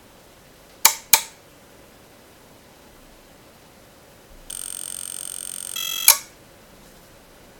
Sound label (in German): klick